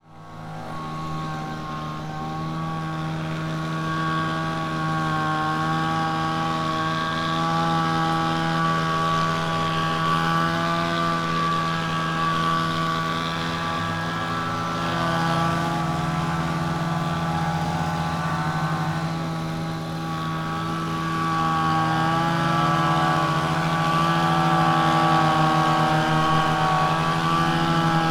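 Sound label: center square, Fukushima Soundscape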